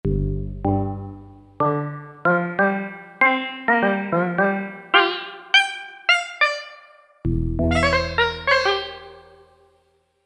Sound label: Mallet Gong; Korg MSC-1S Variety